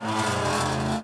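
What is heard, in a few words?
cauldron Arm Swing; objects; Star Wars Obi-Wan; XBOX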